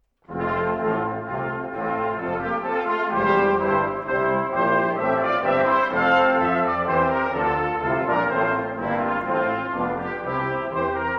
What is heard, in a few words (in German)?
Menuett